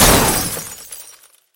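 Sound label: glass shattering with flying shards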